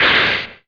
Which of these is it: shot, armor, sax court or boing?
shot